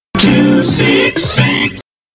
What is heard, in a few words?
jingles